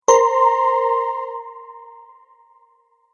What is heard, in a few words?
Tap Error, tapping